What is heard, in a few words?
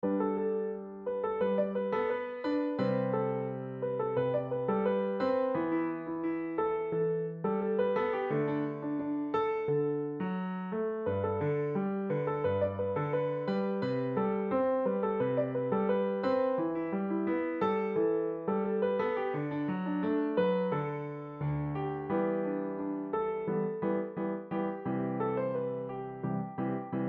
solo, piano; Pati Patni Aur Woh